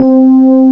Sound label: classical detuned low; direct sound samples